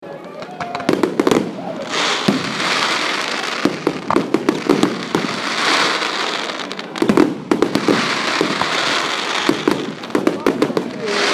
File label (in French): Feu d artifice